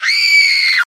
Scream Female; friday the 13th killer puzzle

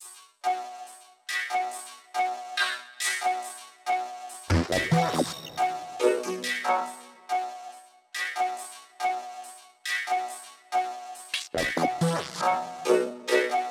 Transition Engineering Add Perc F#; Bust n Rush

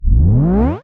Tape Start

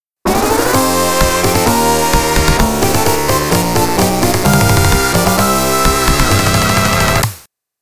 Stage Clear

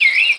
bird random; CS Level3

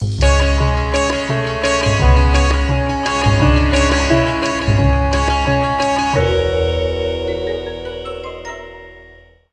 Bari Sax

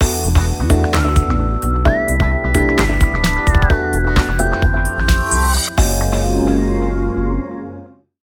Start Jingle

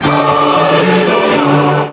Hallelujah; serious sam siberian mayhem 2022 pc gamerip; Easter Egg

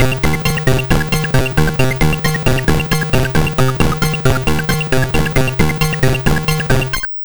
Level Complete

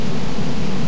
watcher knight spin ground loop; Hollow Knight

